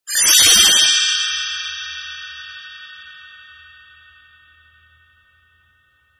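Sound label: Fanfares, singing cue, instrumental; Rapunzel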